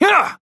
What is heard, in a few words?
effort melee small; Trapper